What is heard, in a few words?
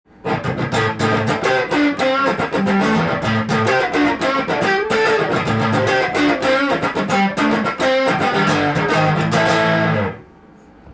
guitar